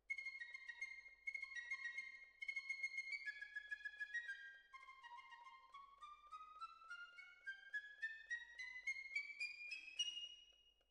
Violins, jazz for piano

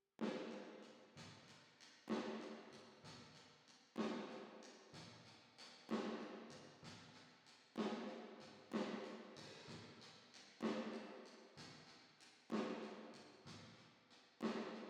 Drums Verb

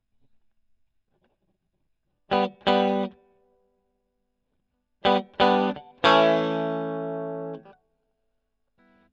E. GTR Gefell

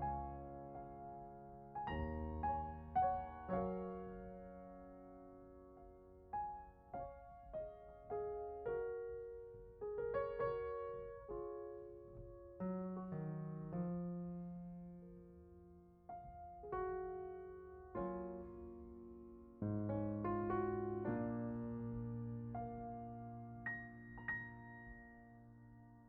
Piano Mono

